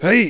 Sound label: jump; 1997 MMX LAN PACK (win 95); Worms Armageddon; Jock